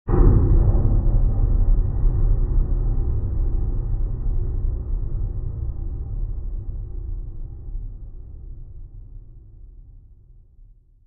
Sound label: Bell Bell Chime with LFE, Pulsating Fade